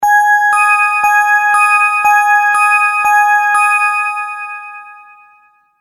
Multimedia Tone-Thinking/Processing

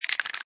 Cartoon Chatter, Teeth chatter, comedy rattle; Comedy/Cartoon CHATTER; Funny Sound Sound Effects; Lynne Music

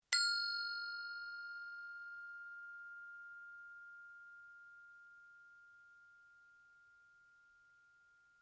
Bell Tibetan No Striker Ring, Tibetan Brass bells; Musical

